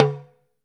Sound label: TALK DR, TALKING DRUM, Africa